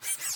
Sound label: focus failed, ui; Lenovo; A806